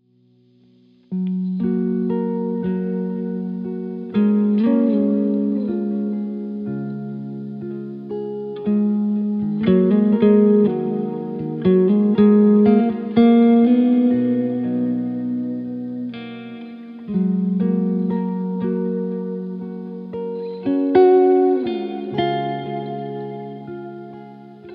Calm Music Guitar

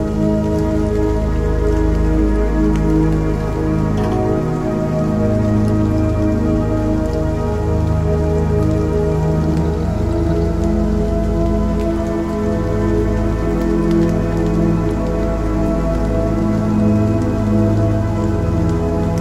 Rain forest sound in nature; helps in relation of body and mind; Zen Music

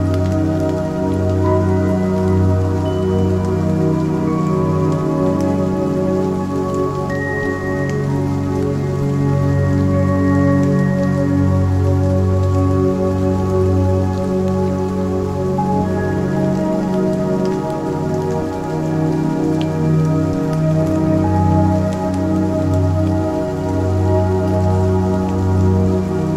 Composed breeze meditation Zen Music

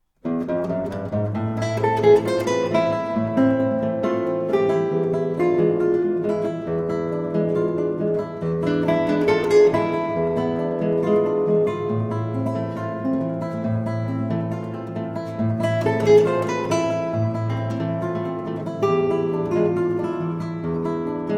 Milonga

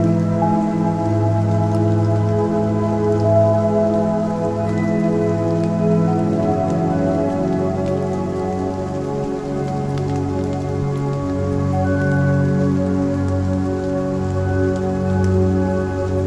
Calm rain sound in nature; Zen Music

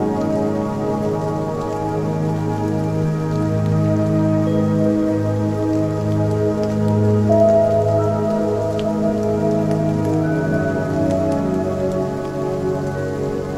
Still forest meditation Zen Music

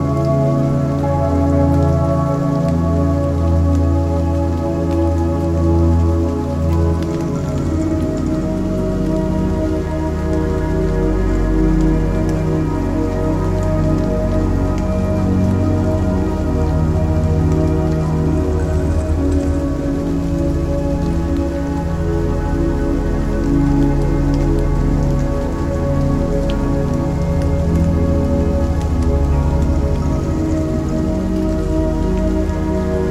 Rainstorm sound in nature, Zen Music

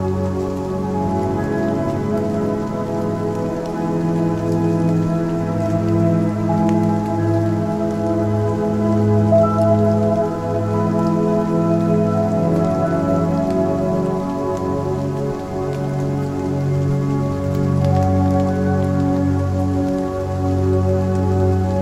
Isolated ambience meditation Zen Music

